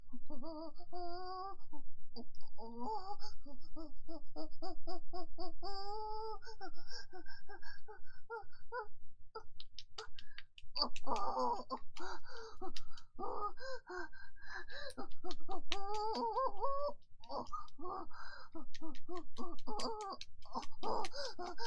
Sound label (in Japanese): オホ声 オッオッ 快楽のままに激しいオナニー